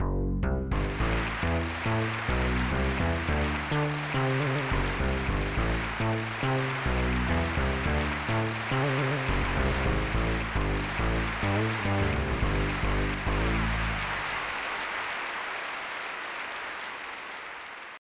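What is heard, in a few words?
synth bass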